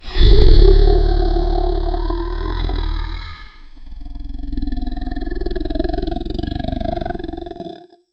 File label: dragon designed vocalizat; vocalization